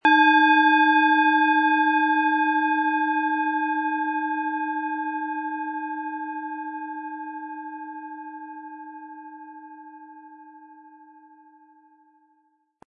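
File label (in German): Planetenschale Eros inkl. Klöppel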